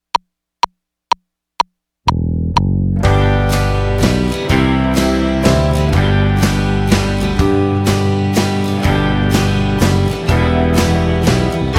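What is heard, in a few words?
Waltz In G Without Guitar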